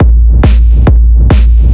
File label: drum, 138, drum-loops, Techno; Techno ID; fatboom